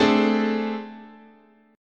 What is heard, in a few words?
A Minor Major 7th Double Flat 5th, guitar chord